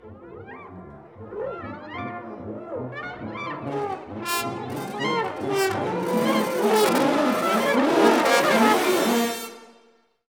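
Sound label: Brass Ensemble FX; Roland L-CD702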